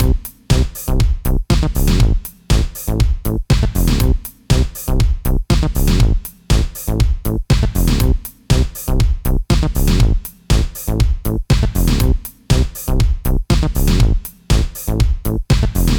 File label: Chain No Comp; sidechain compression in ableton live